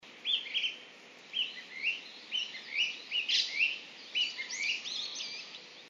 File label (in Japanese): kibitaki kojukei; mane